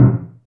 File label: THUD DRUM, FILM PERC; Drums In Your FACE!